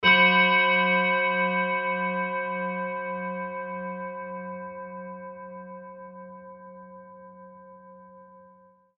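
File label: tibetan bowl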